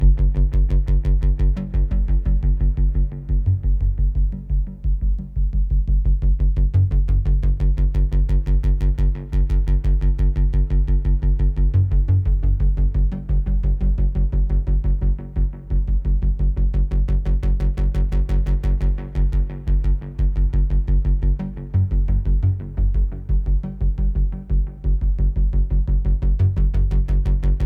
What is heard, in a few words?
Music Tension Pulse Low Tempo; Battlefield 3